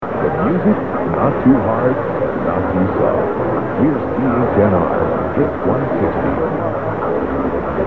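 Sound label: AM radio station, Simcoe Ontario; 1600; mw dxing; CHNR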